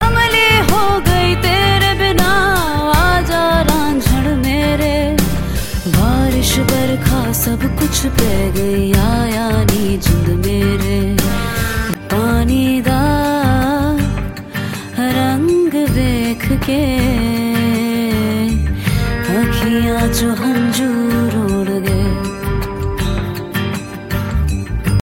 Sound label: female